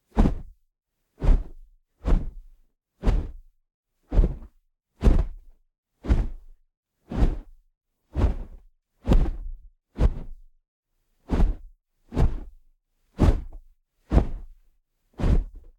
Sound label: swipes and whooshes tshirt fast and short swings stereo; ORTF 8040; Soundholder